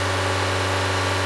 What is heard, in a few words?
engine run